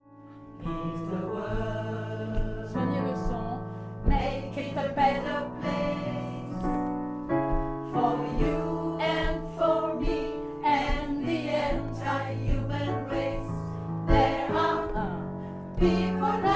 refrain mezzo, gospel

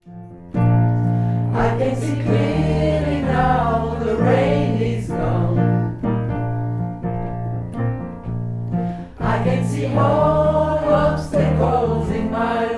couplet alto homme, gospel; couplet 3 & fin

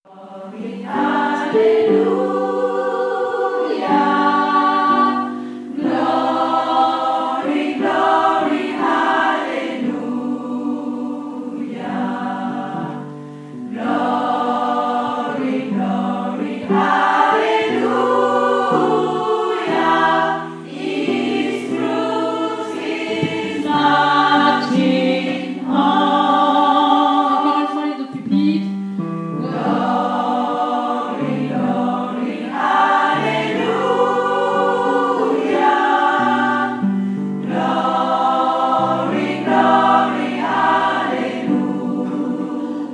Glory mezzo et sop

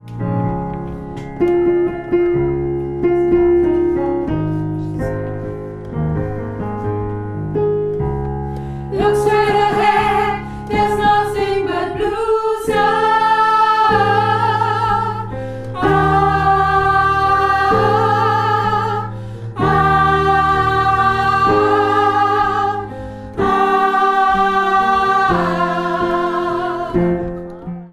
pont soprano, gospel

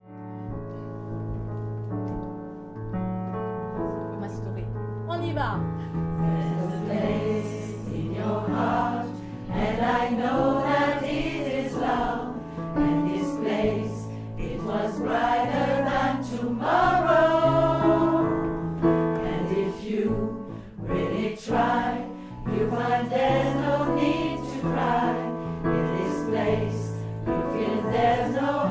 couplet unisson; couplet 1; gospel

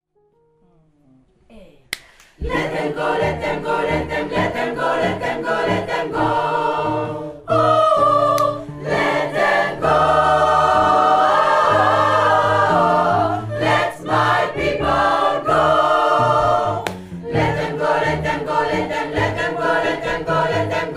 partie tous; gospel

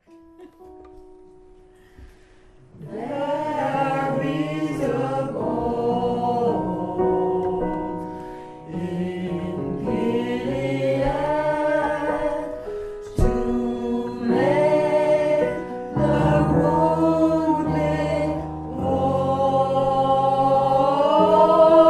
refrain alto; gospel

